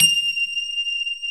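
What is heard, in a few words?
grand piano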